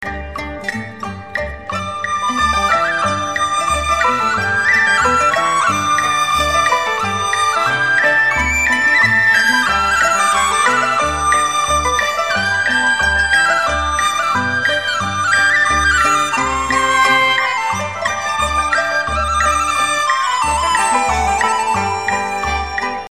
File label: bgm